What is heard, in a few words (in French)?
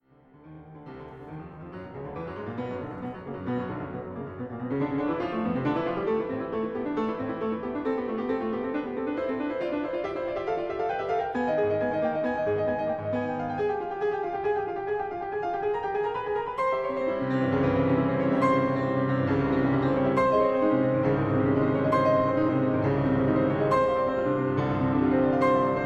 concertos pour piano, allegro; Concerto pour piano et orchestre N°1 de Zara Levina